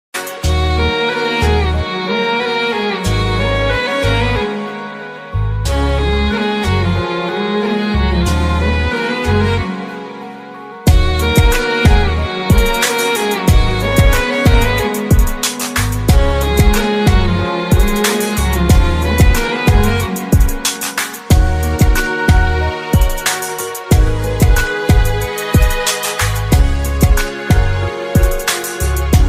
Sad Instrumental Ringtone Sad Music Ringtone; Sad Song Ringtone; Ar Record

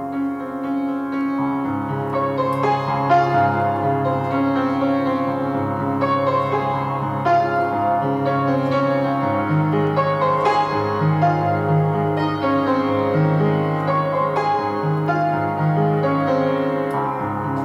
Atomic Bell, ringtone

